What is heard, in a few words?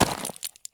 landing slate; Escape from Tarkov